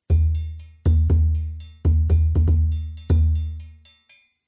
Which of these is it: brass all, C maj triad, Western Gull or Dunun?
Dunun